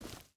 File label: footstep hide forest walk; Rust